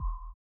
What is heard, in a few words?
SONAR; Spectrasonics; Metamorphosis